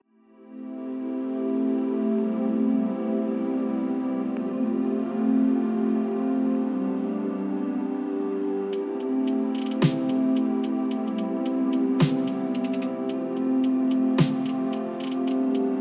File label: instrumental lofi